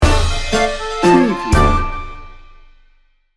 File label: midnight in rome sting bumper